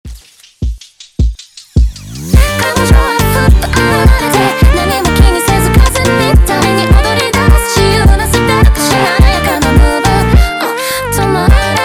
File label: Som Do Moro, funk